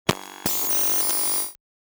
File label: tech sound